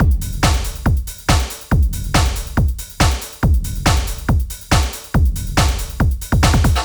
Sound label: 140 C trance kit; Trance Explosion Vol1